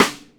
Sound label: Rimshot, Snares